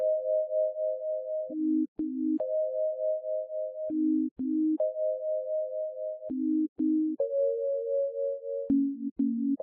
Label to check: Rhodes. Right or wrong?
Right